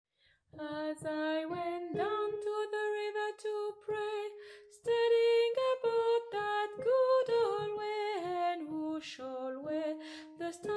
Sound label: Down to the river soprane